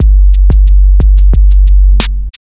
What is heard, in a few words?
slow deep, drum loops; hh drum loops